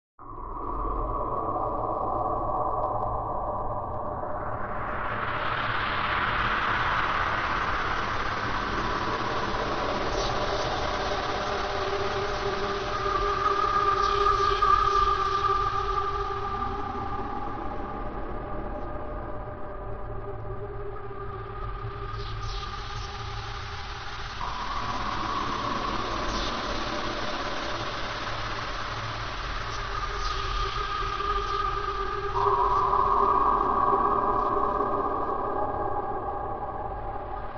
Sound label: sound design soundscape horror fear drone regular bright hits